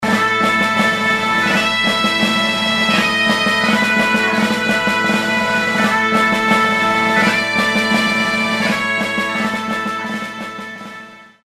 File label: bagpipe; Skottland